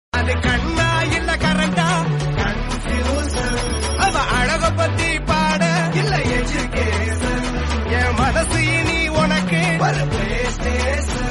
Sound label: romantic instrumental